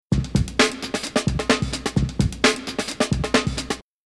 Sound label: Rhythm Breaks Loop